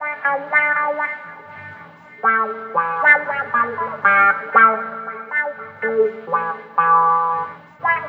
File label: Wah Guitar; Copshow1975